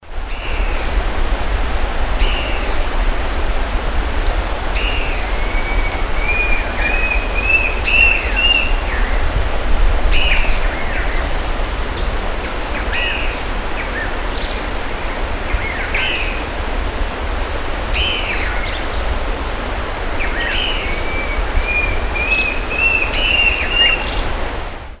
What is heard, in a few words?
Mackenziaena severa couple; Faz Mont do Japi; Jundiai; SP